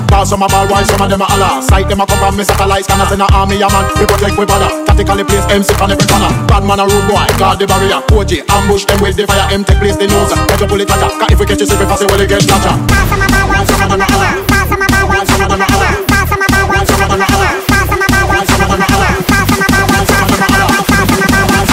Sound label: Reggae WOAH